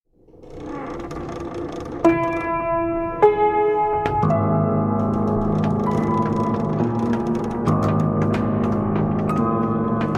Broken Piano

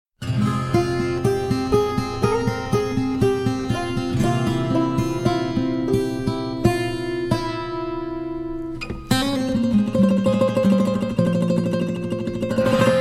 Guitar Version

